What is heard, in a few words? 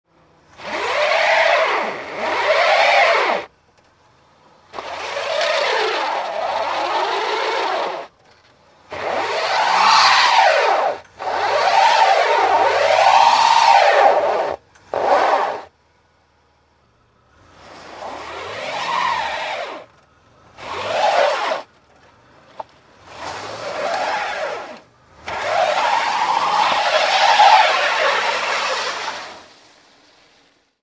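Car skidding in snow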